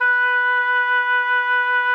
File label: B4, OBOE; Xsample VOL-6